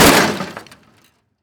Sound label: metal plate hit